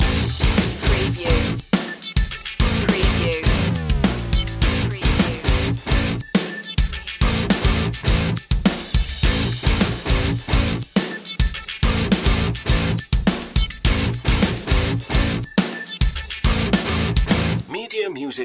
melee master loop

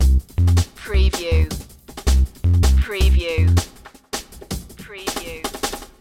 town beat loop

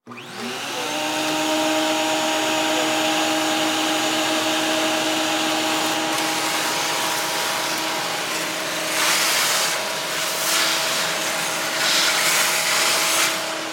Shop Vacuum Operate; sound effects bible

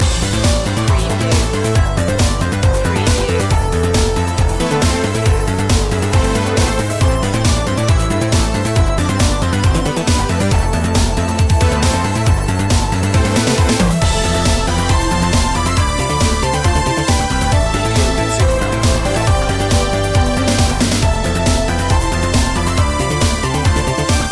retro techno assault loop

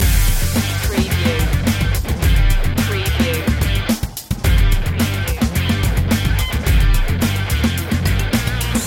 beat on fire loop

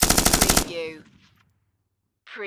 Machine Gun M249 Long Burst Close Perspective

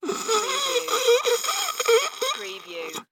Choke Breath Single

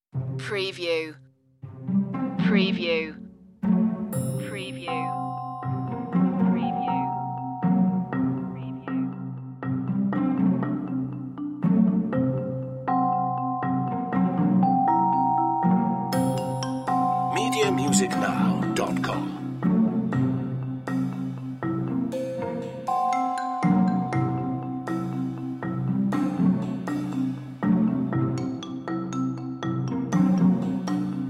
no piano

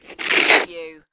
Snow and Ice, Ice scrape slide

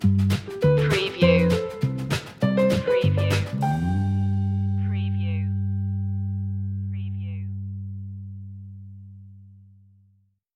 spring air stinger

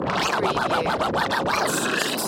DJ Scrtch

